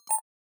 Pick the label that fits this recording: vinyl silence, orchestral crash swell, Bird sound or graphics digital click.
graphics digital click